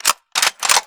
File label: Gun Reload Weapon, Weapons Foley; SFX Kit